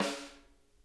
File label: snare rim, drum kits